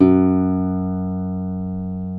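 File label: F#1 classic, classical guitar; Northstar - New Gold VOL-2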